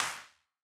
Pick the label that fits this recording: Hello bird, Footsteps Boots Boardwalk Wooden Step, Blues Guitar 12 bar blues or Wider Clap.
Wider Clap